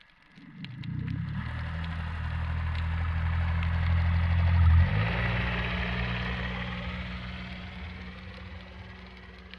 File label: Boat Passages, Underwater; Trieste Miramare; Ambix; Brahma